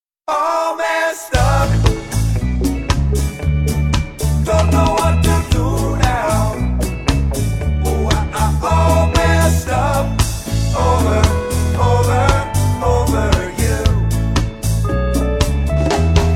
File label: Reggae